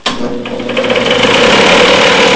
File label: startup